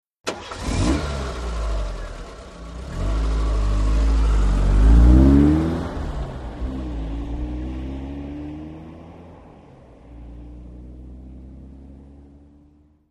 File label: Aston Montego On Away